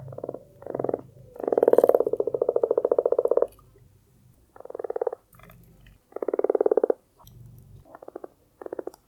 frog; dfcaverns; df ambience

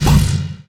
portal extinguish; nether

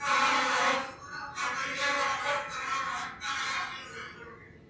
flies; dfcaverns; df ambience

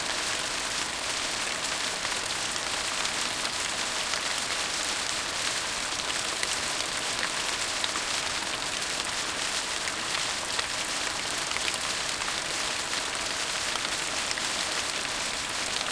rain; server-nalc; snowdrift

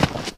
sand footstep; nalc game

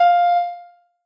noteblock f2; mesecons

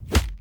punch; mobs redo

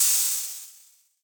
lava

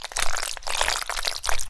oil footstep; dfcaverns

